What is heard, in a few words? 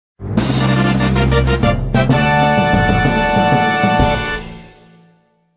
Tower Boss Clear Theme; NSMBW